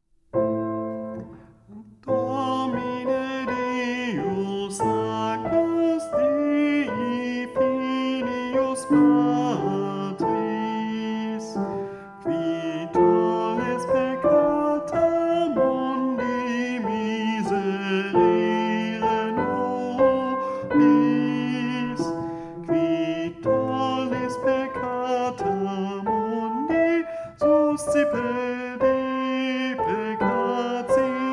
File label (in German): Sopran